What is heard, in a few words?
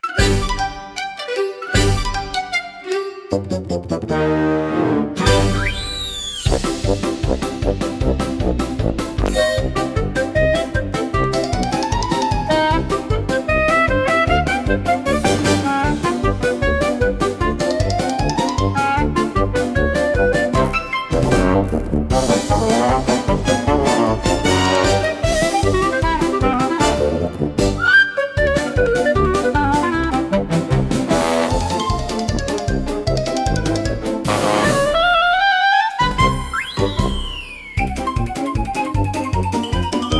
Tuba Tumble Chase, comical orchestra for slapstick gag scene